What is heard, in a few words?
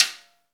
snare, Roland